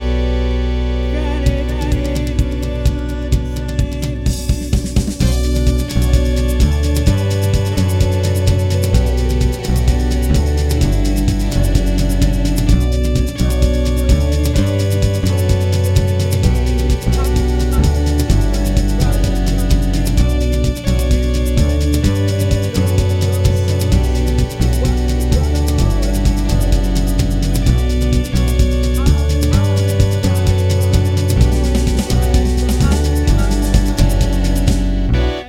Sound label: doom synthpop